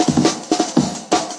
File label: 172 amen rollup; 161 to 180 bpm